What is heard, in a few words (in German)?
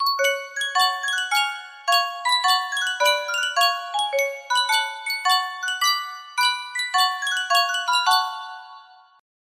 sankyo miniature spieluhr